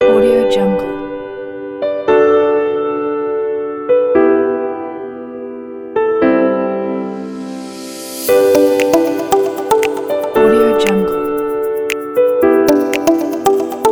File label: upbeat inspiring corporate